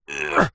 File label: human male short fight vocalisation